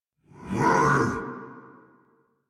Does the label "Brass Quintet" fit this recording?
No